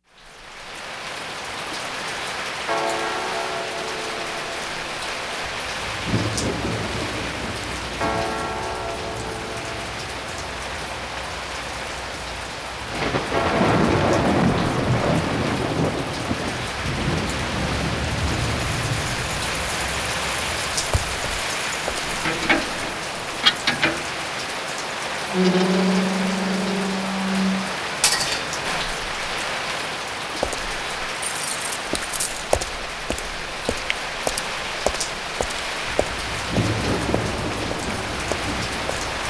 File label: Spooky Ambient Score